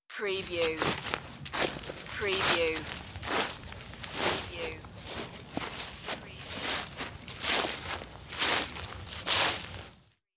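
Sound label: Rake Leaves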